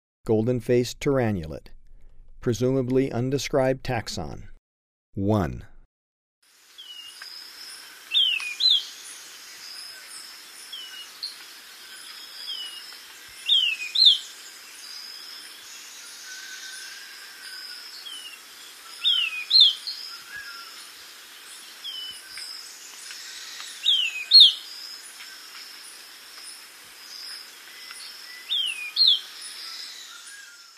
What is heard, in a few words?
Golden-faced Tyrannulet (possible unnamed taxon); S. America